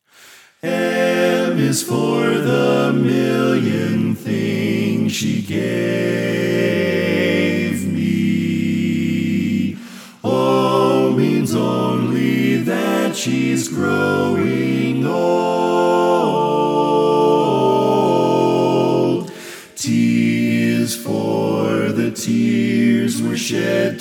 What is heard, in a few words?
Lead Left